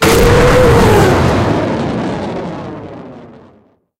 158mm shot, cannons tanks

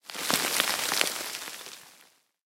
tank impact rocks; tanks; War Thunder

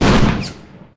Damage Plane Hit; DCS

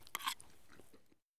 item foodcan foodtake; Escape from Tarkov

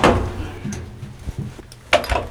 Vehicle reloads; Red Orchestra: Ostfront 41-45

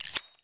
generic pickup, knife; Escape from Tarkov

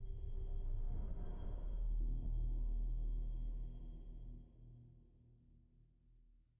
sting metal groan; Rust

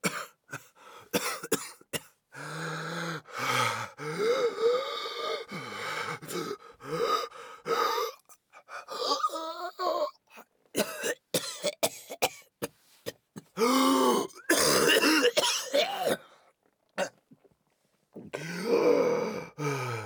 breath death; Escape from Tarkov; scav4

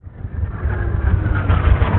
Artillery; Red Orchestra 41-45

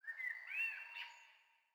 sting songbirds; Rust

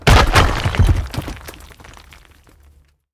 fence stone crash

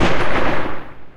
12mm tails close, guns tanks

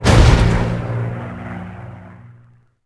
Fighter Hurt; Civ4 beyond sword

